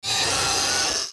pterosaur cry

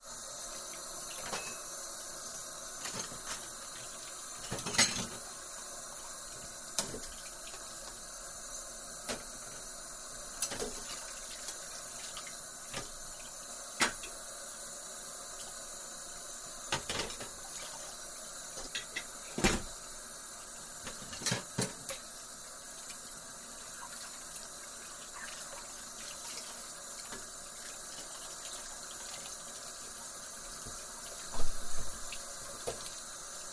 sink dish washing

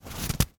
snow seize

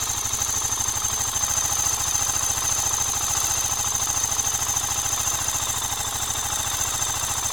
bike idling

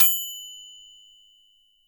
microwave tin

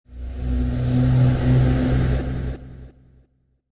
magic gravity

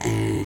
pig cry

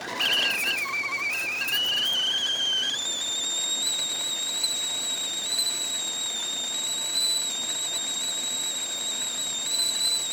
kettle boiling

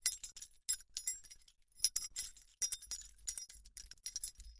key

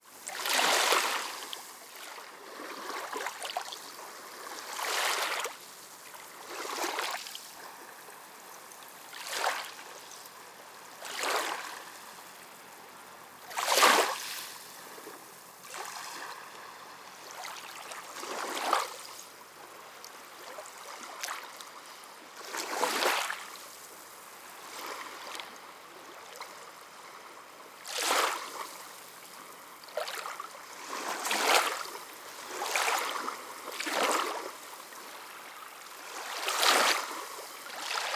wave

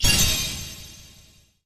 decision, button

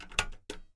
lighting off

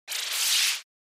magic charge

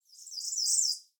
phylloscopus ijimae twitter

